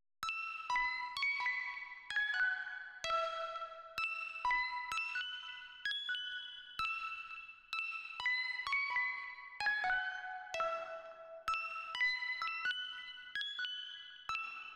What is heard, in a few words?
128 BPM C# Min Lead, House; Loop Stems; Cymatics; Breakdown Loops; You And I